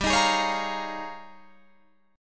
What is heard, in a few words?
D sharp 11th G